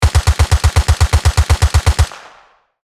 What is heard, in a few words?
M16 assault rifle short burst